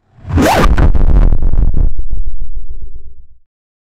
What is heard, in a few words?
cartoon sound effect; games